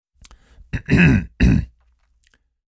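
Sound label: sounds throat tickle "khm"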